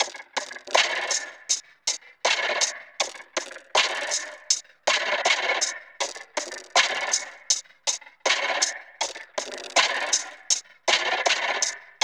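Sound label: vocoder loops; Zero G; Malice In Wonderland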